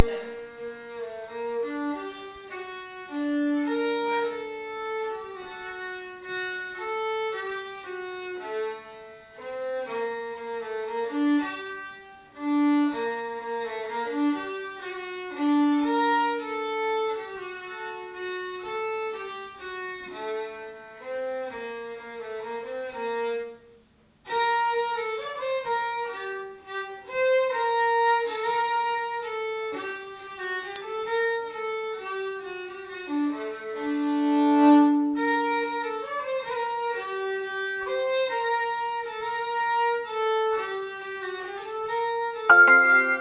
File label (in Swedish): Smygevalsen stämma